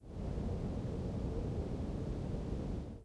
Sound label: Snow; blue archive